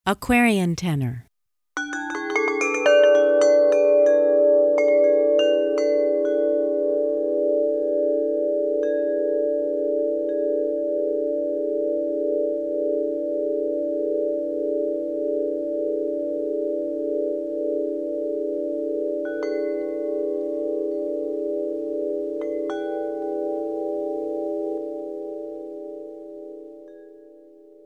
Aquarian Tenor

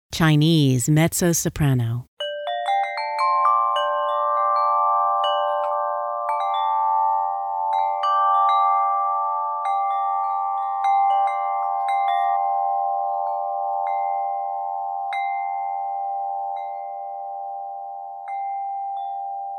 Chinese Mezzo Soprano

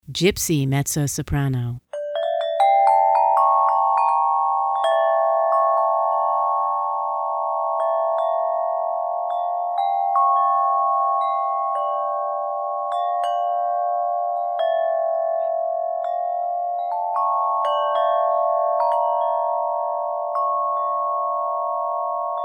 Gypsy Mezzo Soprano

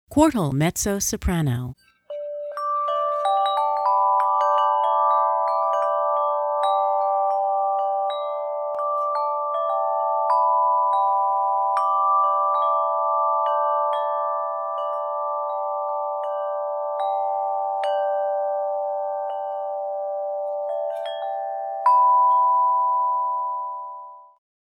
Quartal Mezzo Soprano

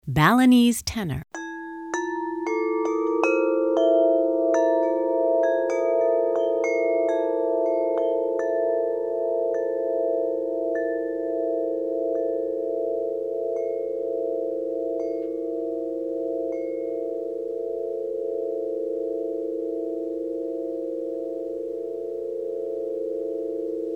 Balinese Tenor

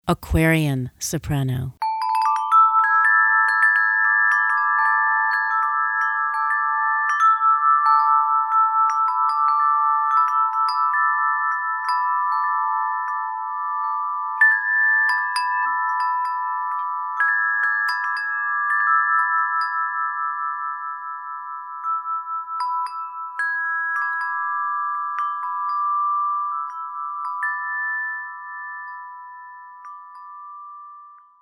Aquarian Soprano

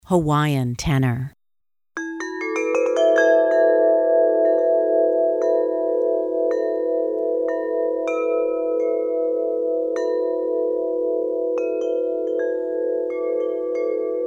Hawaiian Tenor

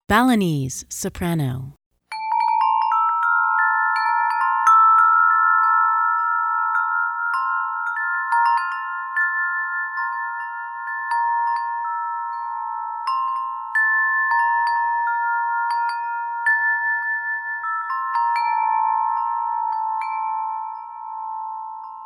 Balinese Soprano

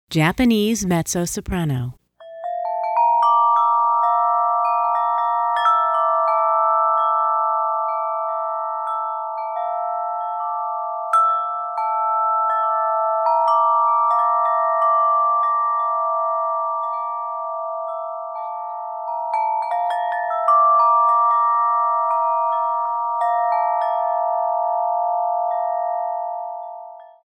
Japanese Mezzo Soprano

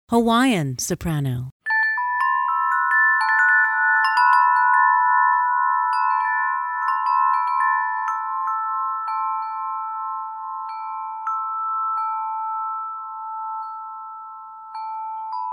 Hawaiian Soprano

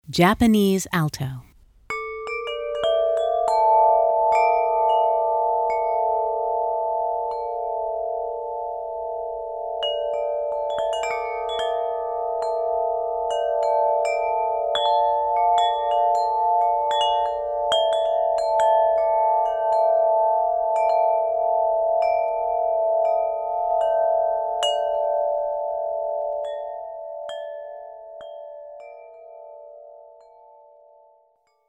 Japanese Alto

